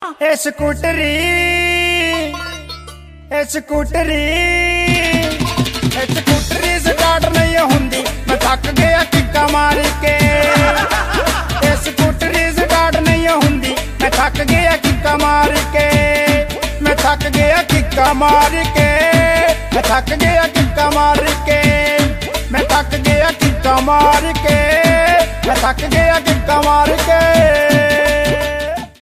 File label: scootery ringtone